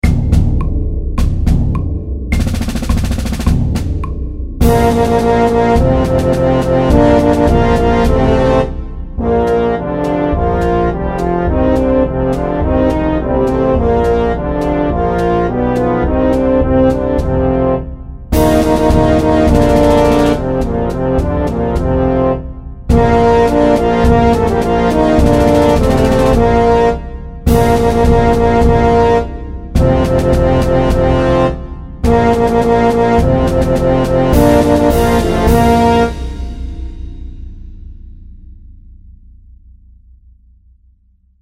Fanfare og marsj middels

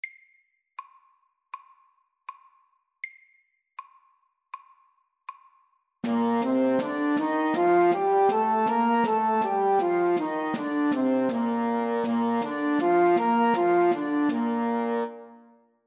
B-dur natura middels

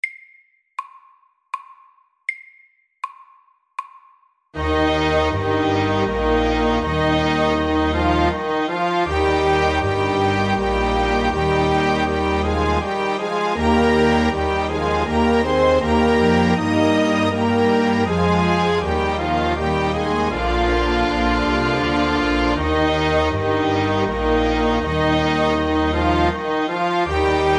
Menuett SLOW 80

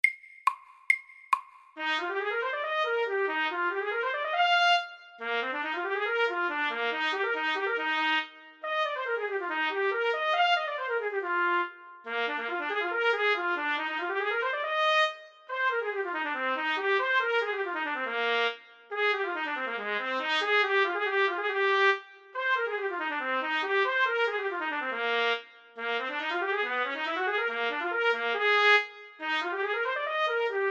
Etyde i F dur FORT

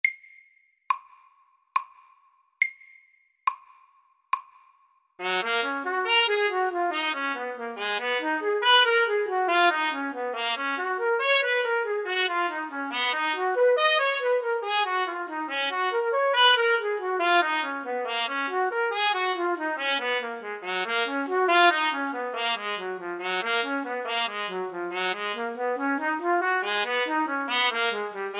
Etyde i Ass dur MIDDELS

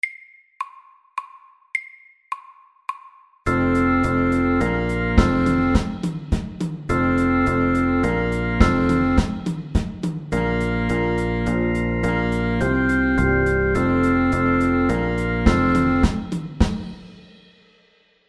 Fusion MIDDELS

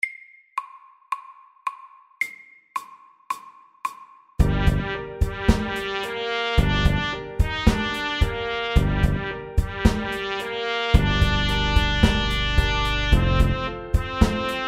Minor rap middels

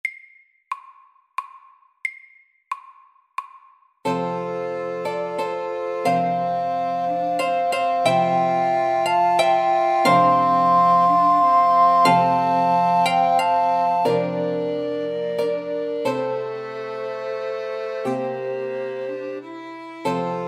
MIDDELS; Skye boat song

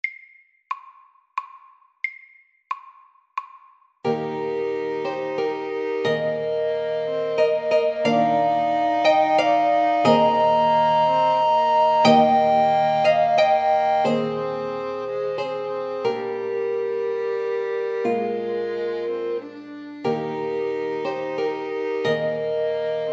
MIDDELS; Skye boat song

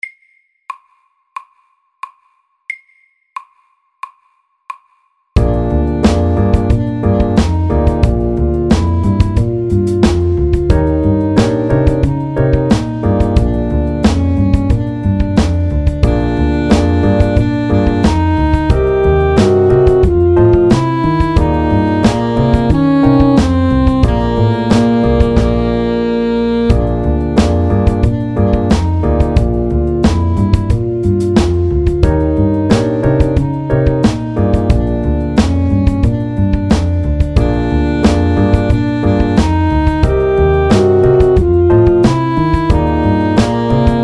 Kromatisk blues MIDDELS

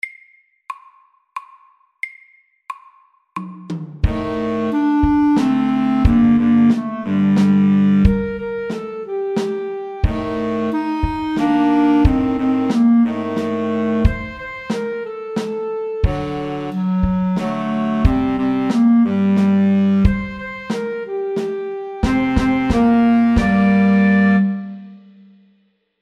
Ekko MEDIUM 90

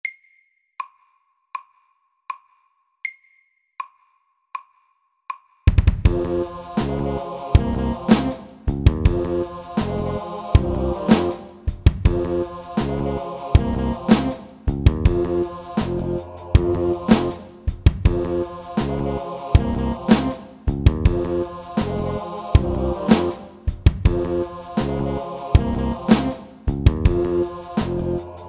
Octave rap FORT

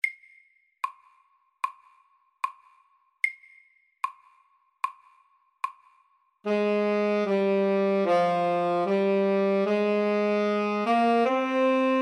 Etyde SAKTE

